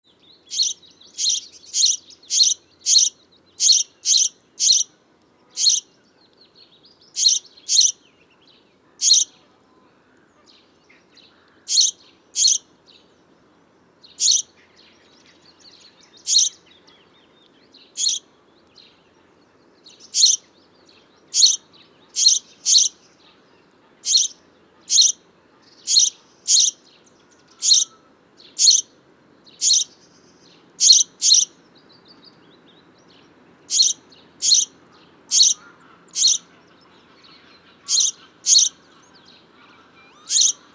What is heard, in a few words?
Passer domesticus; SETE